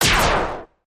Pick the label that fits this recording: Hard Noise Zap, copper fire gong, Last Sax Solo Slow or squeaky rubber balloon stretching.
Hard Noise Zap